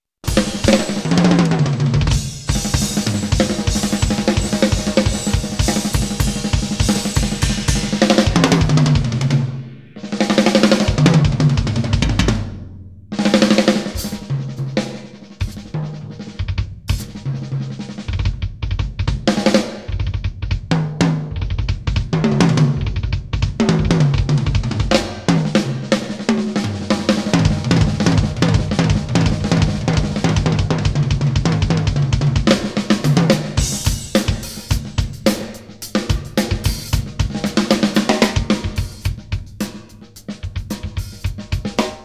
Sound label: Drum Solo